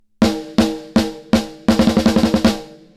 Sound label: snare; FET-76